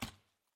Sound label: Juicy Hit